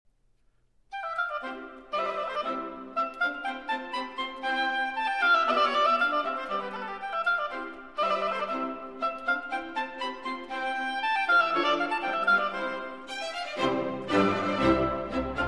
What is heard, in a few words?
Oboe Concerto in C Major Rondo; Mozart Oboe Concerto in C Major, K. 314 - III. Rondo (Allegrett